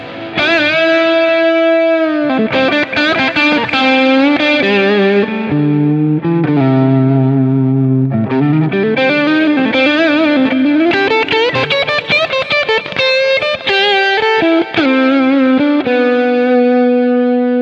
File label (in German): Paul Reed Smith Crunchy Lead div. PU Kombinationen; Thomann 70th